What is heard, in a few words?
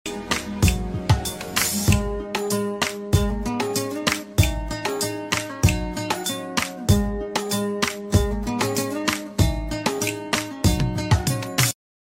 dance bgm